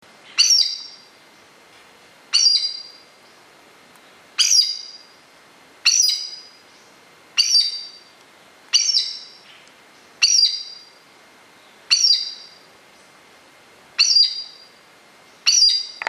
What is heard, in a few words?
Chestnut eared Aracari Pteroglossus castanotis